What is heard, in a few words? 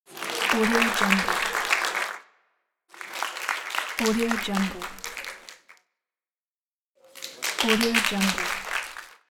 applause